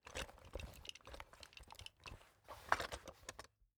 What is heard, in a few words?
Industry Belt Tool Belt Moving With